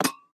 Peg Leg Footstep

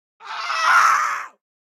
town human road; Blind Drive

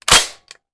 Machine Gun Foley; Sound Ideas; Guns

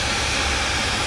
Steam Loop

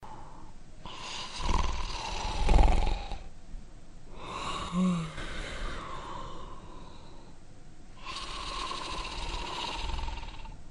monster sleeping; blindside

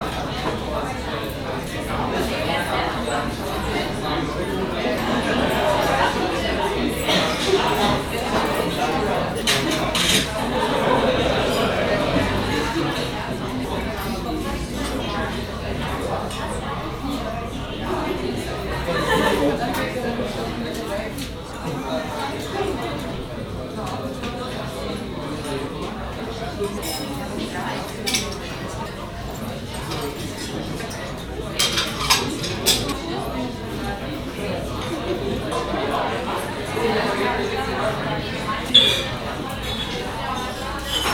restaurant students; best service Blue Box

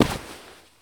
Baseball Dive Grass

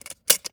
Electric Timer Turn; Clocks, Watches and Timers; Blastwave

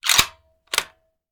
Rifle Foley; Sound Ideas; Guns

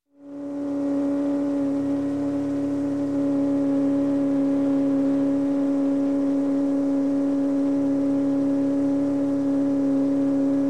Boat Outboard, 20 Hp - Driving Along, Marine; Sound Ideas; Series 2000

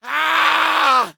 male scream; npc; DeadFright

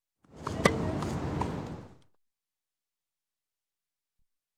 Tennis Return; Sound Ideas 2000 Series

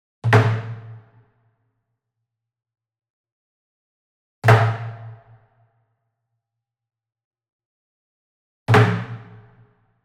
Wood Percussion Log Drum Traditional Flam Medium; boom library; Cinematic Strikes Construction Kit

